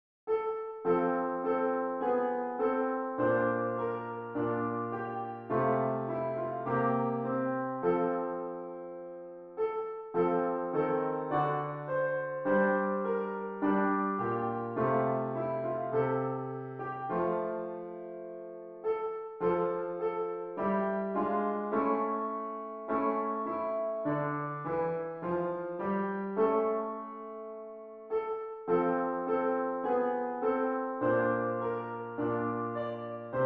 o little town of bethlehem piano

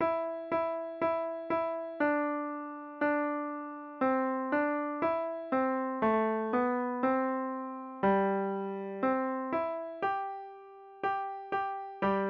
waltzing matilda beginner piano

